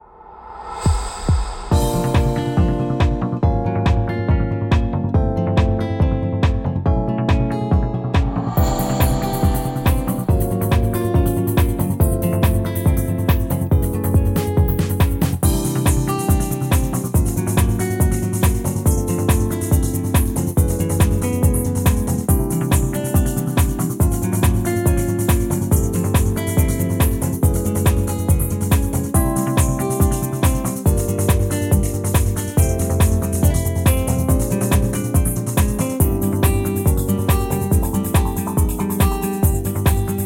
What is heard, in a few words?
smooth